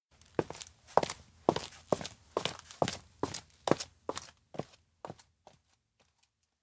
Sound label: steps; anemoi